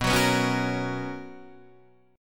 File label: B Major 7th Suspended 2nd Guitar Standard, chord; BM7sus2; strummed; JGuitar